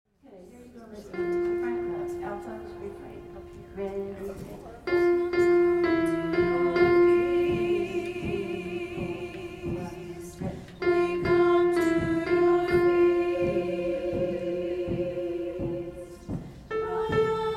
alto; Gather Hymnal

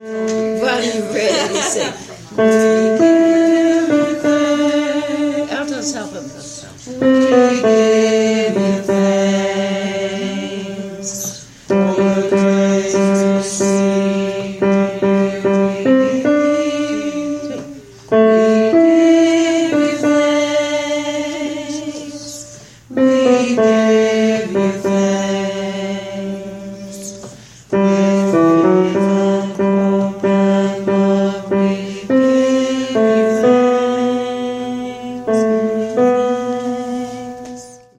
tenor; Gather Hymnal

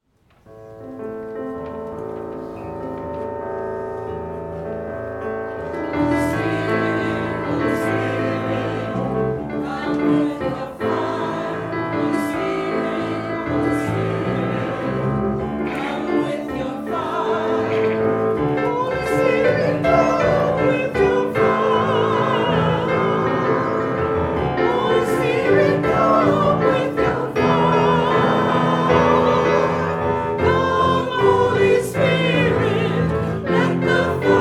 Let the Fire Fall vs 1 and refrain

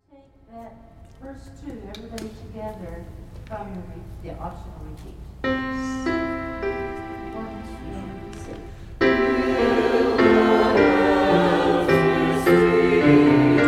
Holy God We Praise Thy Name all parts repeats

